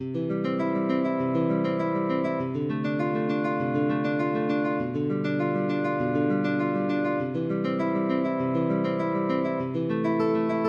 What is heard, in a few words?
bach prelude, guitar